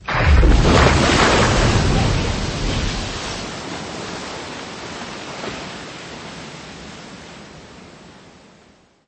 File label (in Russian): grohot ot avarijnoj posadki samoleta v vodu